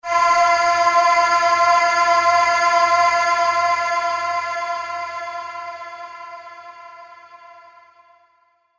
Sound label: fairlight choir